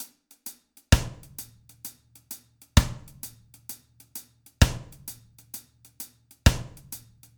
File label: beat